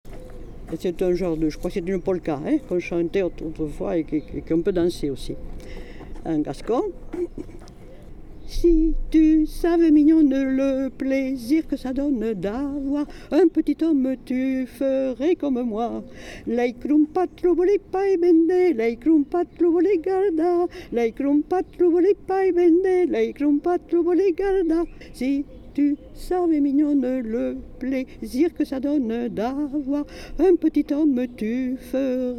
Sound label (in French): chant gascon; Castet-Arrouy printemps 2021; sonothèque nomade